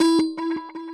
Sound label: spin scatter; In The Forest; Wazdan